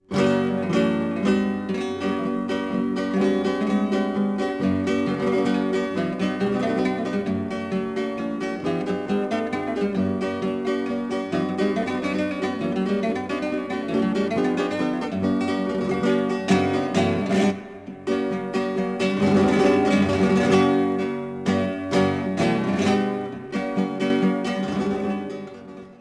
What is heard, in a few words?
FLAM GUIT